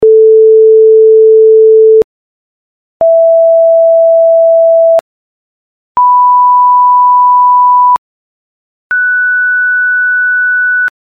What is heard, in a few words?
fifths